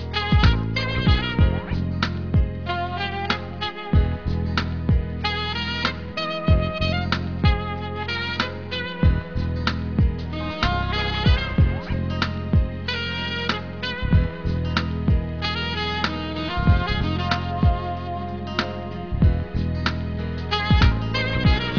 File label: jazz